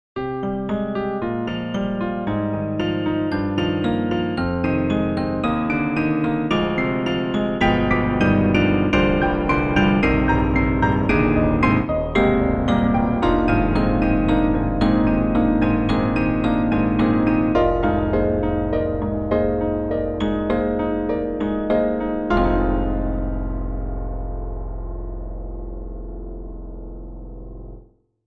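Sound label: Broken Piano Gentle Touch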